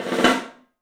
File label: MARCHIN ROL; 3D Sound; 3D Drums